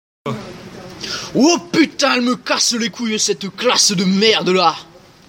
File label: vocal